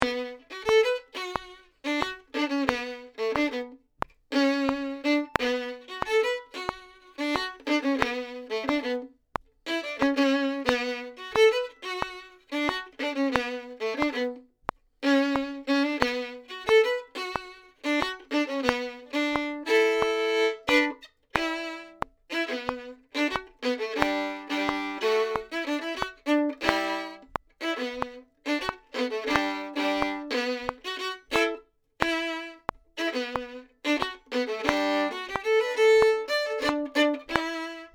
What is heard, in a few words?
Violin; senior audition